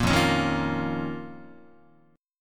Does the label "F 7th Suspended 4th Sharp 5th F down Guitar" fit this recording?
Yes